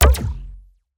shoot; lightturret

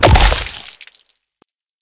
maul; battle

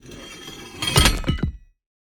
battleship; pirates

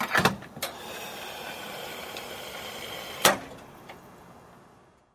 gas; milebymile

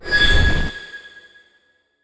spectral alteration; battle

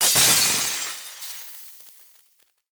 dissolving bomb; battle

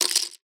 bet, 3 card poker

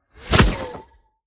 speed drain; battle

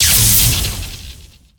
electric sphere; battle

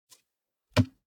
discard; cards

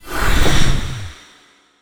intimidate; battle

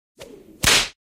steel-tipped whip; battle

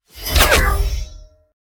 guard drain; battle

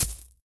win, blackjack

